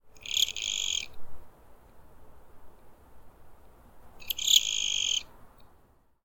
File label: critter; matmos hl